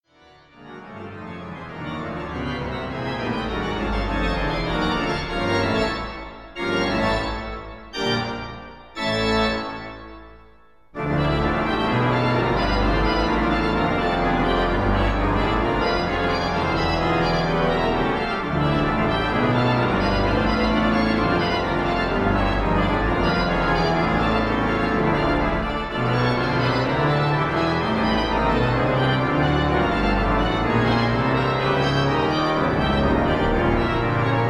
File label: Organ